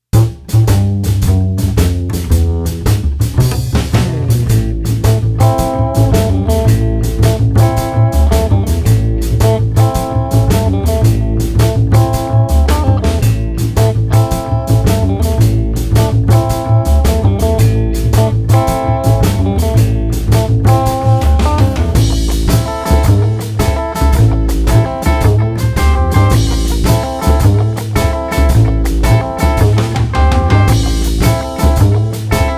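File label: Street Double Bass Guitar Shuffle, 110 bpm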